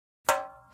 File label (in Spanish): Cha cha cha